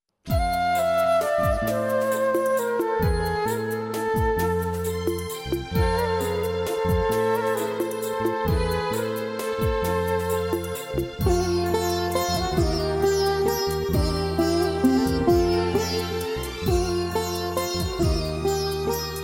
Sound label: X'an Devotional Karaoke